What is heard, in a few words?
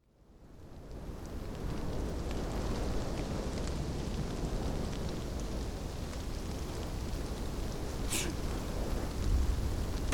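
Rainy Village ambience Only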